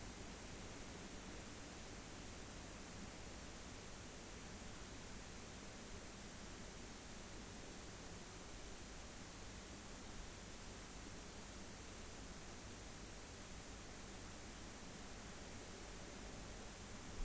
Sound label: rayol farm; SEAMED